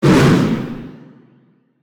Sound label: Wind Bolt; SNKRX